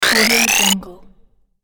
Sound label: scifi loading bar